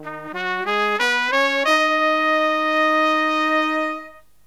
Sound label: Killer Brass Riffs, MED; USB Soundscan vol.29 - Killer Brass Riffs; AKAI